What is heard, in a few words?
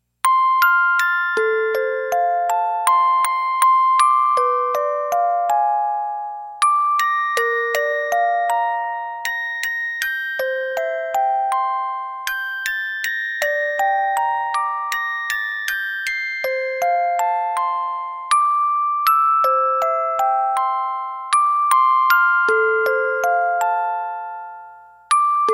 musicbox